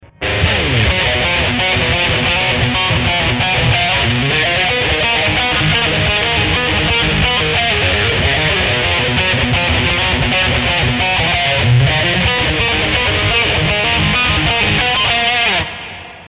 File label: DZL dist, guitar